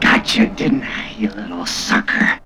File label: VOX GENERAL; AMG Megabass REMIX! VOL-2